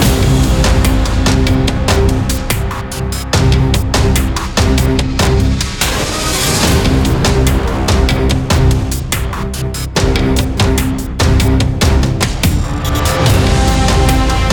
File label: bgmusic high combat; Iron Marines